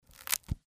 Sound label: Book Closing